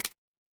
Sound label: cartoon stretch